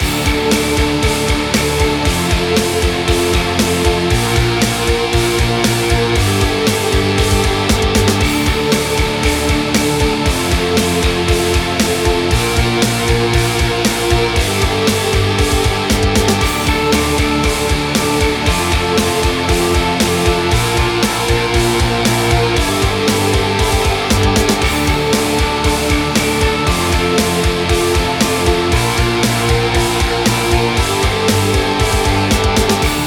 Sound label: post rock; aetheric